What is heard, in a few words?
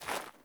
Sandals Walk Snow, Footsteps; Characters; Player